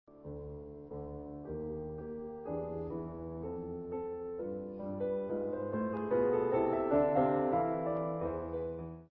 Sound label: Inv Fugue